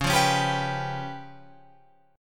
C sharp 7th Flat 5th C sharp down Guitar Open F; C#7b5; strummed; JGuitar

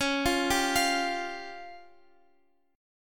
C sharp Major Flat 5th, Guitar, Open F; arpeggiated; chord; x,x,13,12,10,12; JGuitar

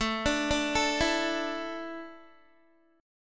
A Suspended 4th, Guitar; Asus4; arpeggiated; 16,17,14,14,16,x

